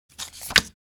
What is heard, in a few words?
Playing cards flipping a single card from a deck poker blackjack